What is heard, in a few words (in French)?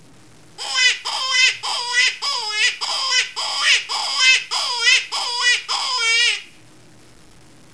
Lapin à queue blanche